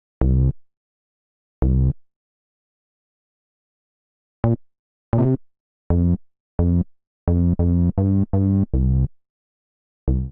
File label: Slow Pull Back C Minor 85 bpm, Bassline; MIDI Clips; Tonal; Ableton; Factory Packs; Chop and Swing